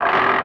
GEAR GRIND; SIMUZENSOUND

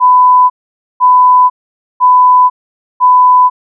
BELT; SIMUZENSOUND

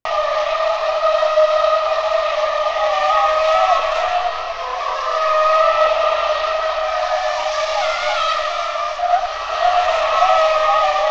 SKID DRY; SIMUZENSOUND

